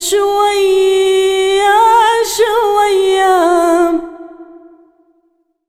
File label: SINGER, ArabianTraditions